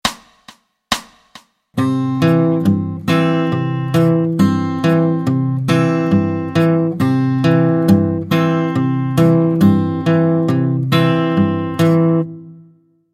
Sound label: Travis Picking